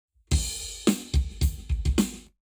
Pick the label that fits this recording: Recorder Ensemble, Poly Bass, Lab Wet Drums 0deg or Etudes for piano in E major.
Lab Wet Drums 0deg